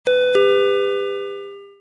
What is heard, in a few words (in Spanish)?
campana